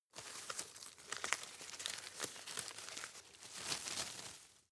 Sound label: rustle medium long; berufe; foerster